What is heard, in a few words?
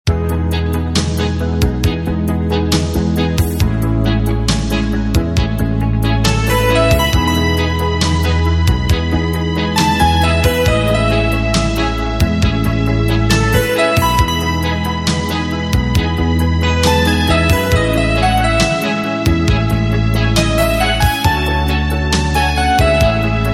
Romantic